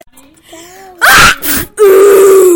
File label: sneeze